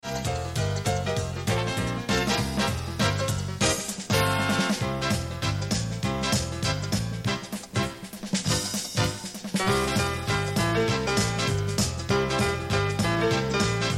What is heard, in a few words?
melodiya; muzykalnaya skazka